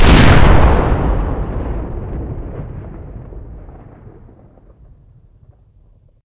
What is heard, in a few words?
bofors shoot; tf2classic